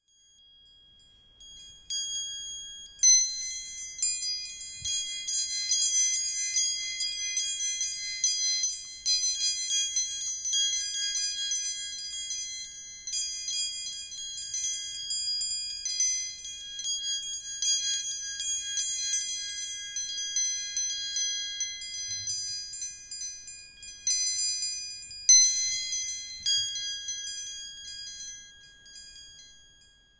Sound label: doorbell; doorbell schuetze